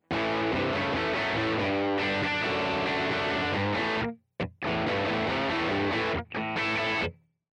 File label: dirt, guitars